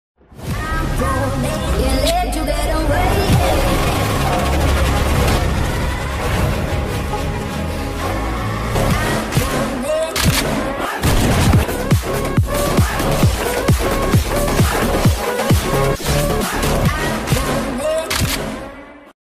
movie ringtone bgm; marco movie; unni mukandan

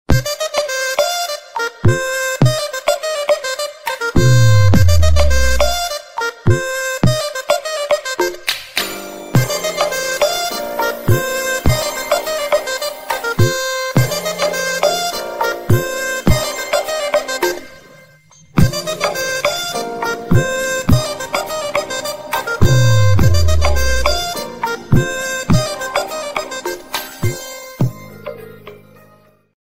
ringtone remix instrumental remix ringtone

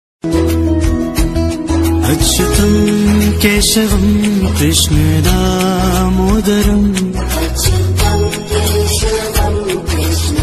flute ringtone, radha krishna ringtones

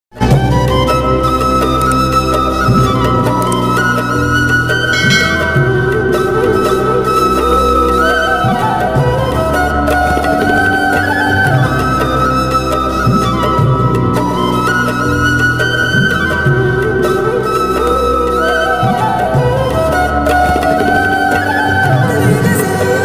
love feeling ringtone; anjaan raju bhai movie; hindi

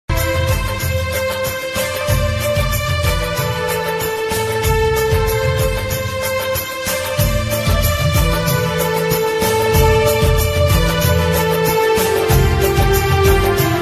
ringtone

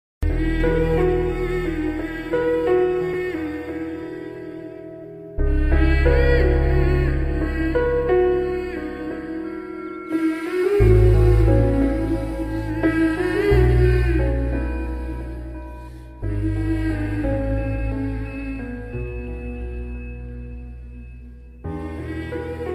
telugu movie bgm ringtone; awaara

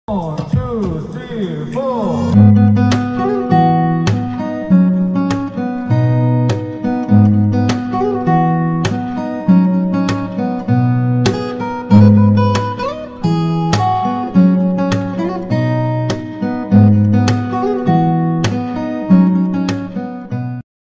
guitar cover ringtone

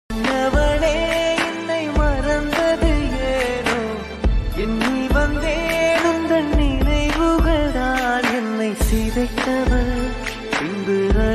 sad bgm

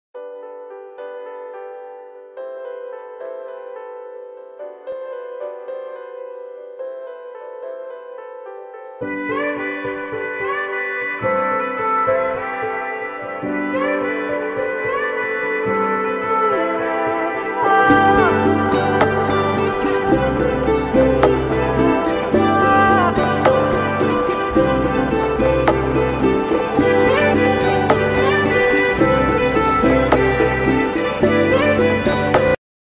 instrumental ringtone theme music

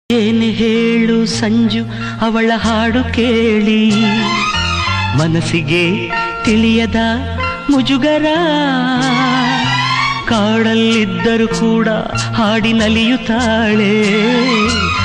kannada song; premaloka; ravichandran

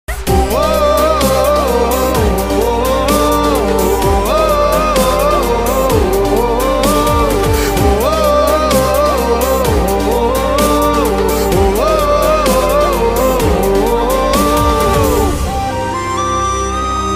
love songs; indru netru naalai

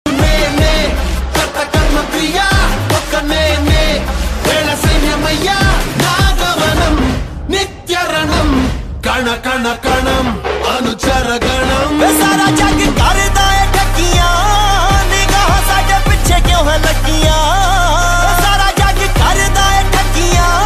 theme song ringtone; kalki

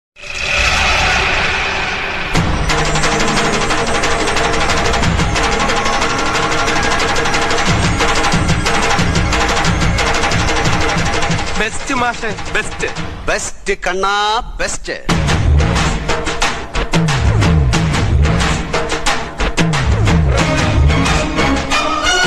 malayalam movie bgm ringtone, entry; chandrolsavam; mohanlal

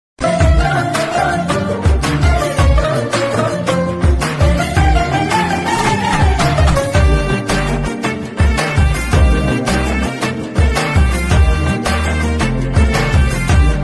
romantic; hridayam; couples status; love whatsapp status

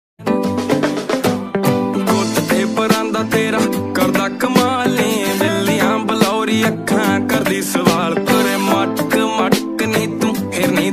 punjabi ringtone punjabi song ringtone

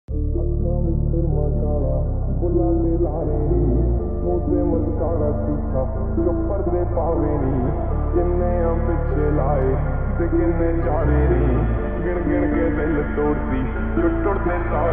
slowed reverb; pubg montage edit

